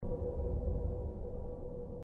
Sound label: sounds of Moon NASA Voyager Recording